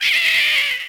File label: Man's Scream; SNES; Yakouchuu